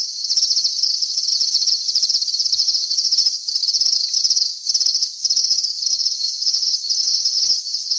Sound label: crickets night; Nature; weather